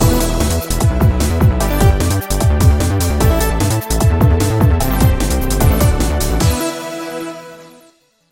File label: !Level Complete!; Block Breaker Deluxe 2